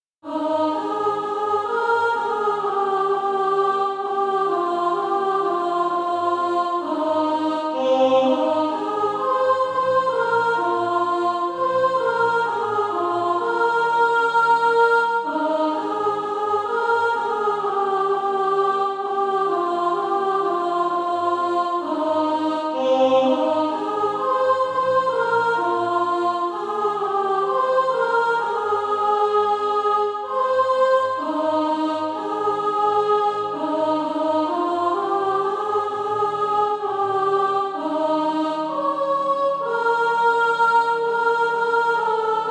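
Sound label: soprano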